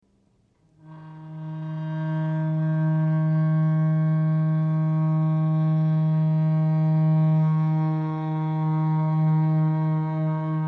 music royal waterway; Life is Strange 2; gamesoundopedia

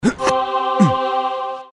chest pickup; Temple Run 2

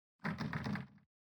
locked door; baldursgate

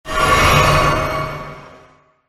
flask of crimson tears; elden ring

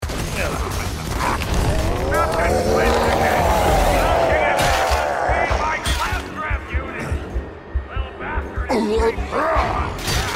psycho buzz axe rampage; borderlands 2; krieg the psycho

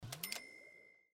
purchase; sims 4

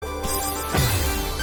celeste sound death

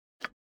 click sound; baldursgate

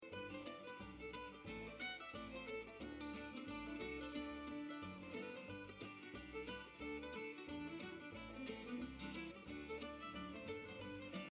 gas station music; Life is Strange 2; gamesoundopedia

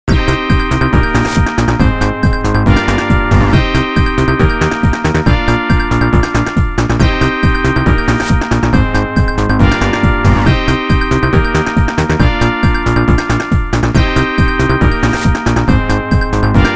ice cap act; sonic the hedgehog 3 knuckles

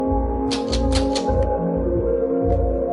fail; We Were Here

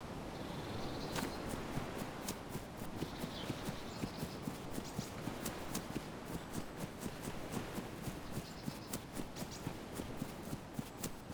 running gras; dayz standalone

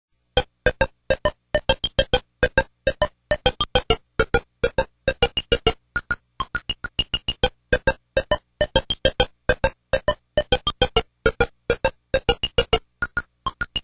ost game over; kirby s dream land 2

